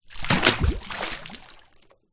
household watering can or container full of water small plastic throw land into; deep water